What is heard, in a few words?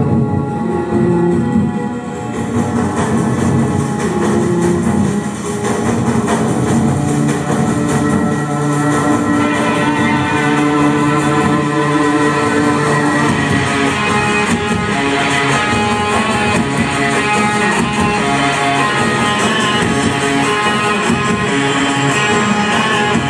live band soundcheck; hofesh shechter company